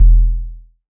electronic kick short; soundscrate

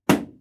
balloon pop

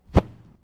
block from hit

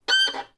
Violin Screech Noise Short; soundscrate

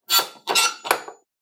Squeaking; soundscrate

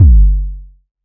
electronic kick; SoundsCrate

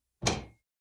Metal Footfall; soundscrate

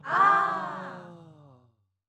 audience impressed ahhh

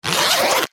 zipper; soundscrate

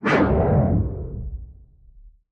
Fire Woosh; soundscrate

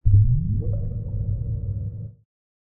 underwater bubble

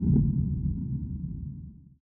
Creepy Low Woosh; SoundsCrate

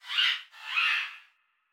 monkey; soundscrate

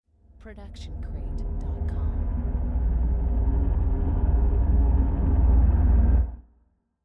low ambient swell; soundscrate

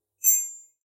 Short Wind Chimes; SoundsCrate

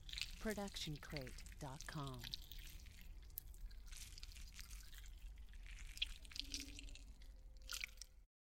stylized squish; soundscrate

